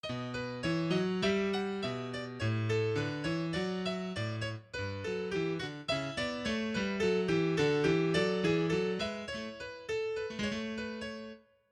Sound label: no hold pedal